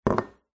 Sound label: cup mug empty set down wood table; household